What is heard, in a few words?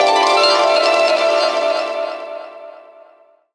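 harp TRAP FX; Rawcutz - Nu Forms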